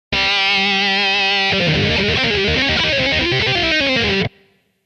Chromatic Outside Lick; Mick Thomson

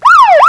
police siren whelp short

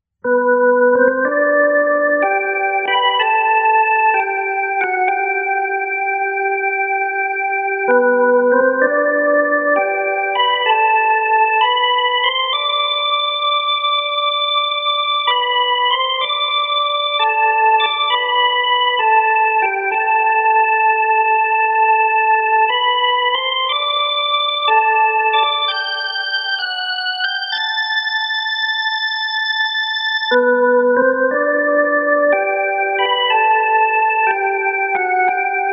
organ lead

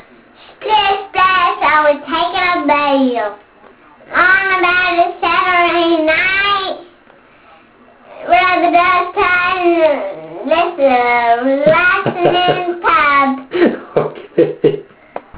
bath

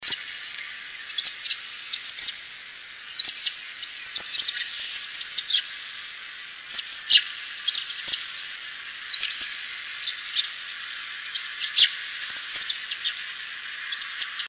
2 y robins

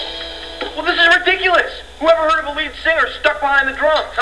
lead singer

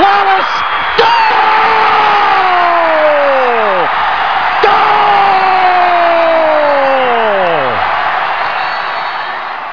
Goal; Lalas Goal

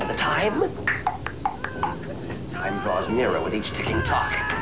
tic tok

